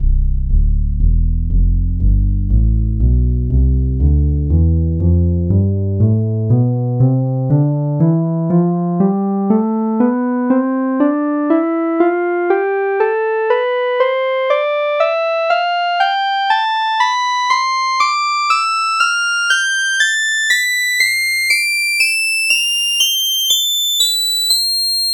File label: synth bass; tonleiter